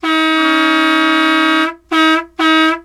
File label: Bus Horns; Vehicles